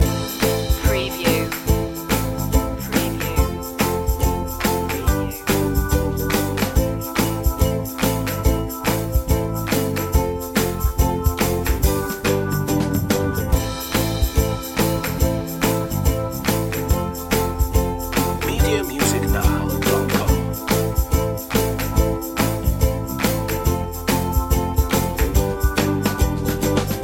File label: jolly ukulele loop